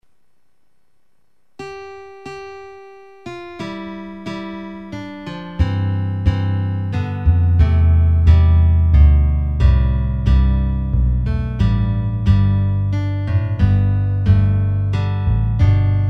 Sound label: Guitars; Around a Manger